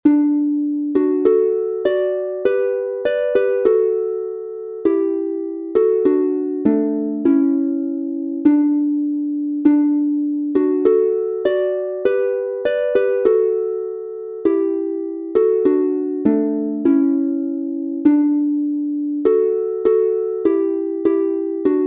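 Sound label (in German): D Dur instrumental, Harfe; Abschied; Jetzund reis ich weg von hier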